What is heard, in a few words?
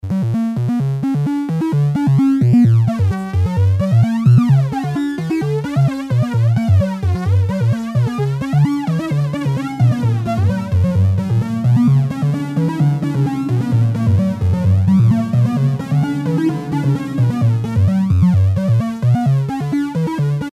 vco